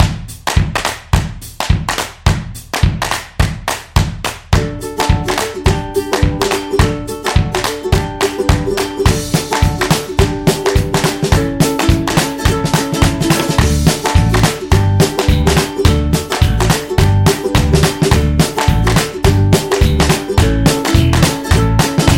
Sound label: Upbeat Feeling, happy music; happy music vol 2